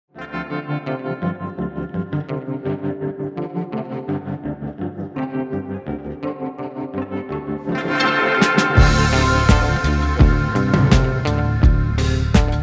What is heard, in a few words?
DARK COMEDY INDIE; The Position